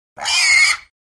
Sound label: Pig Squeal. Single High-pitched Squeal. Close Perspective.